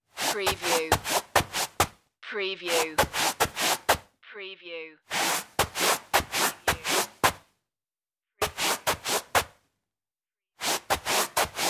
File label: wiping feet